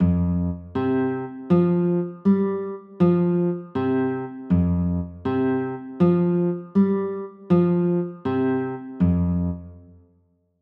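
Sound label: F sus2 chord, guitar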